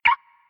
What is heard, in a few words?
stop scatter; Crazy Cars; wazdan